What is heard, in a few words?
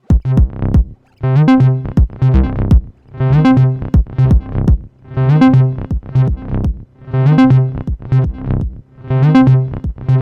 Roland T-8 Beat Machine BD Ducking Bass Rev Dly